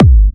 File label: Trance Kicks, Bassdrums